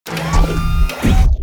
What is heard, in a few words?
hit complex short